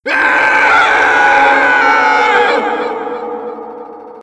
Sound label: Gladiator Scream; tf2classic